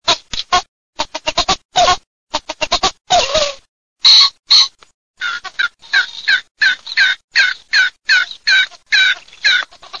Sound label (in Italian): FAGIANO